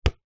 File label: sport soccer ball bounce concrete